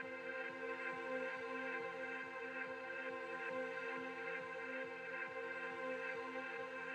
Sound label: 138BPM Myst Melody